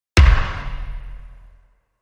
Hit

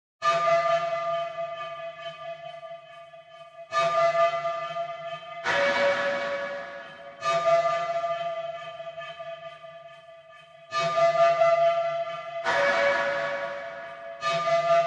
Top Riff Only

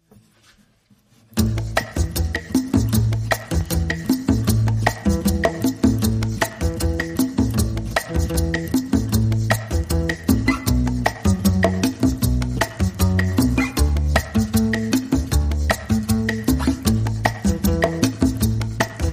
Drums and Bass

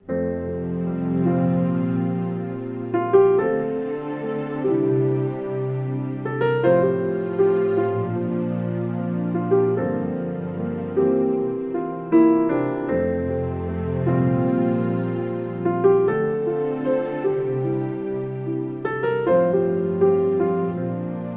With Strings

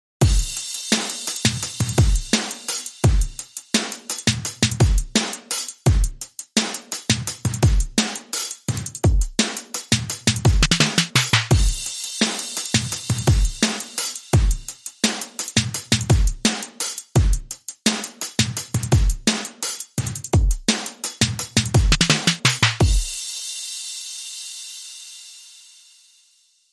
Slam Effect Beat

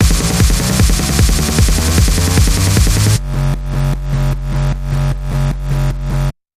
Long Sting

